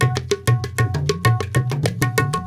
India, Heart of Asia

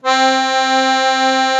AKK VIOLIN C, AKKORDEON; Best Service; Alpine Volksmusik

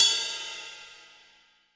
Rock Ride, cymbals; Roland BK-5

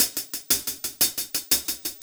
Cymbol Particle; Particles

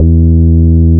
BASS, Tekno Industrial; East West

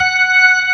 FULL SLOW, ToneWheel Organ; E-MU Formula 4000 Series Vol. 3; Analog Odyssey

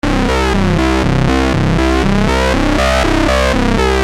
Syn-Bass s C, Techno Synth Loops, 120bpm; Sonic Foundry (Sony Creative Software); Signals I

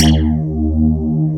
wet bass

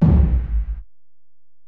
Fx; Alesis DM-PRO

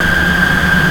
Wah Scream; Mo' Phatt; Digital Sound Factory Mo' Phatt

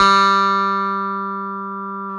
PIA CLAVI G4, PIA Clavinet; Sound & Vision; Gigapack I CD 2

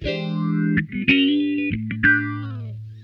SOFT FAZE, Funk Guitar; 90 s Sample CDs; Zero G

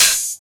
Open HH, Cymbals

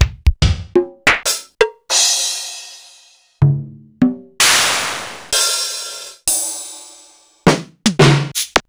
Sequential Circuits Studio-440

